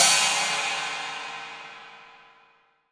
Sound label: Crash Cymbal, 20INCH CHINA